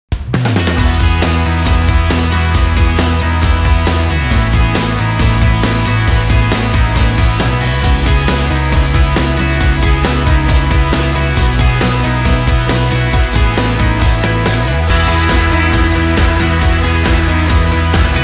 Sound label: Instrumental